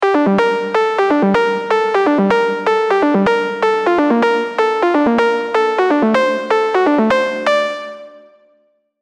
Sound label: Gm 125, Melodic Techno MIDI Melody; Bolt; Techno & Melodic Techno MIDI Melody Collection